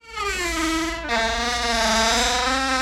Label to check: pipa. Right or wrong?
Wrong